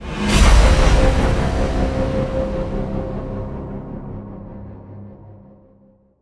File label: Stinger Modulate; extinction deluxe edition; ps4 windows xbox one